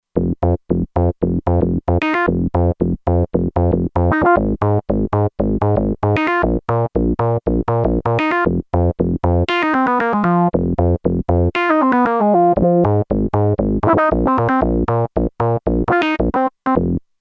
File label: Synth Bass, Kurzweil K2500X; Factory Programs